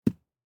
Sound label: DICE on Felt, Drop, Standard, 1 One Die; Samples; ArticulatedSounds; DICE Sfx Party (Extended)